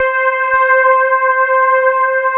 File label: electric pianos; Wizoo Electric Pianos